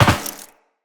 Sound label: Land Metal Perforated, Footsteps; Characters; Tenno